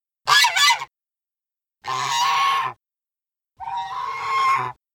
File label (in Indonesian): angsa